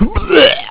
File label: WARNING; pang